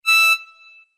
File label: wake up tones; oringz pack nine